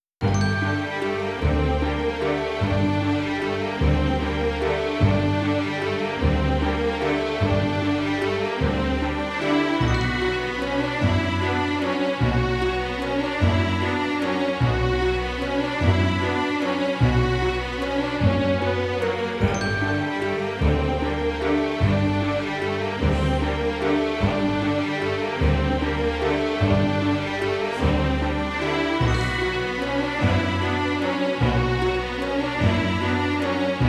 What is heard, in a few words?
enigmatic; By Burak Sound Bank; epic tema paketleri